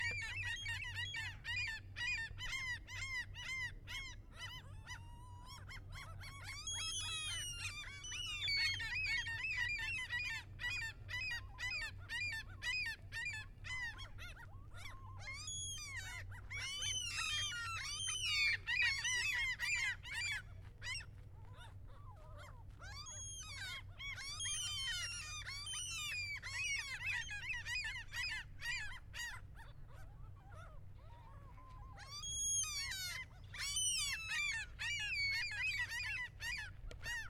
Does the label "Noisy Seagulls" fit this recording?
Yes